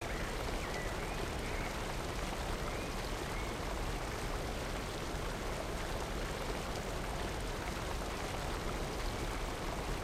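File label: Water Falls ambience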